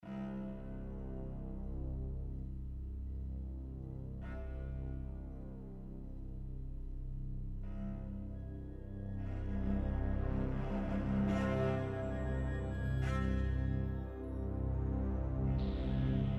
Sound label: drone